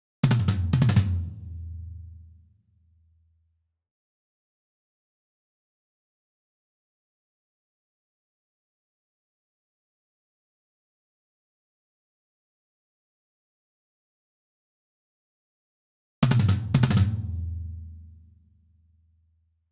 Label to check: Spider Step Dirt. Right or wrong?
Wrong